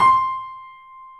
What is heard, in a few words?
Small Pianos, 3-D Audio; E-MU Producer Series Vol. 5; 3D Multis